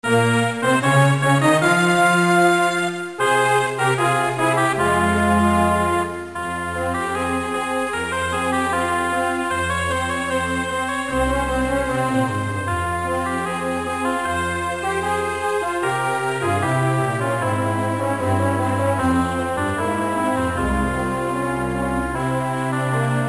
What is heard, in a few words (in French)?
Hymne national Morocco Maroc